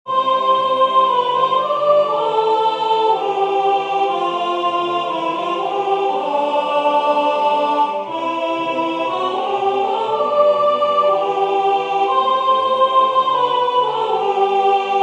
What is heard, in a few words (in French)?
Soprane, chorale; Concert du 13 décembre 2024 en l'Église St Pierre - St Paul